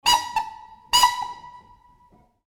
train horn old

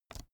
keyboard laptop space release soft

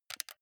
console controller xbox rb creaky press soft

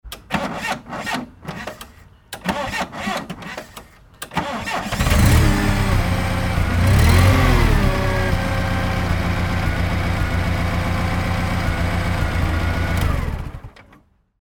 forklift truck switch off

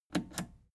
plastic button scratch smooth click disable slow

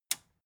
metal button tiny push enable

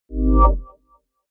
chord swell very short

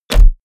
bow hit generic

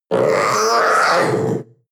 panther young roar

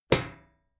club hit sheet ring hard

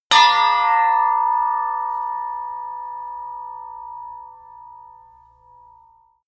sword hit sweetener

